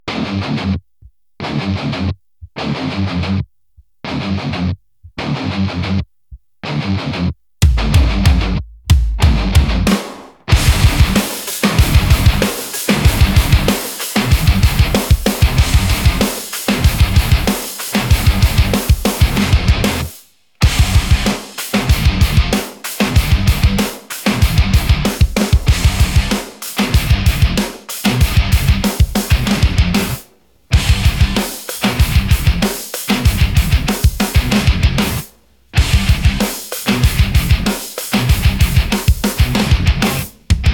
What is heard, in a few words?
metal